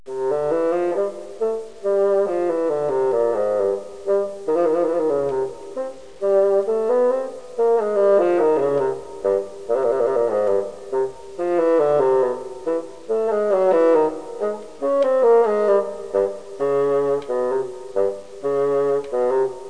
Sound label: bassoon; 8bit